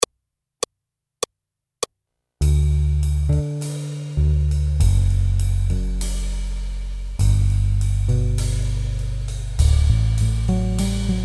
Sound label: Accompagnement seul, piano; Jazz